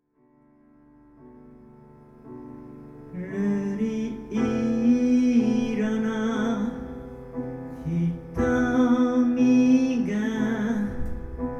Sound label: Triangle